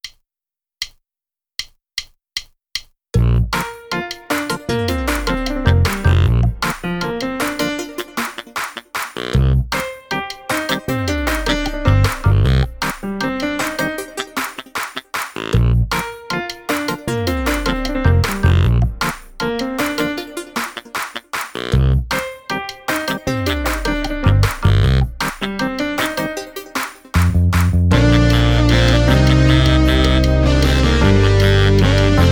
Rock that Pop drumless

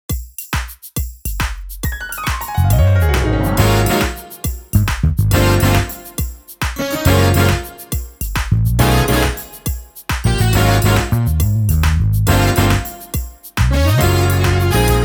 drumless

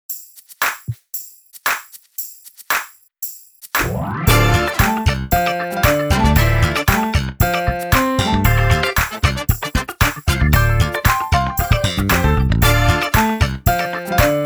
Jamming to the Groove drumless

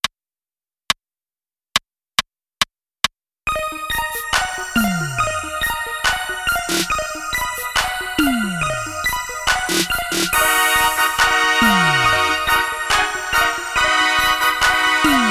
Trap That Beat drumless

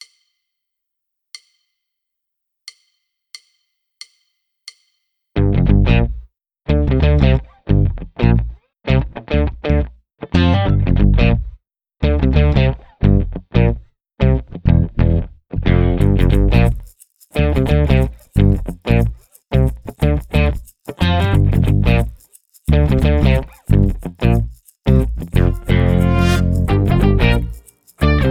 Fresh drumless

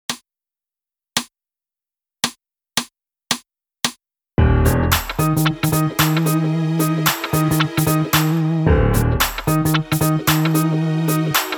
Shuffle drumless

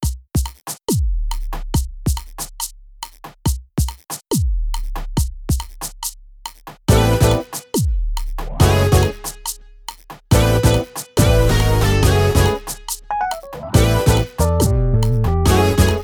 Fusion No Drums 140bpm

